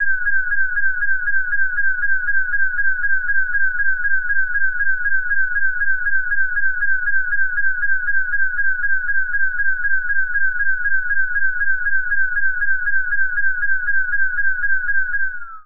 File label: emergency alarm